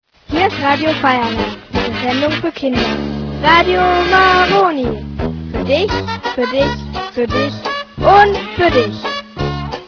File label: jingle